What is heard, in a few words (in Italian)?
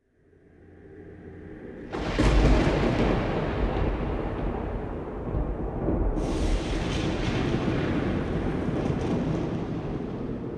Tuono